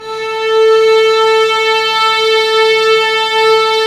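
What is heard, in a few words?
violins; String Master Series; Vlns 6 p-mf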